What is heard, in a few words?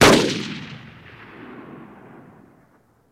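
Gun Shot Single Shot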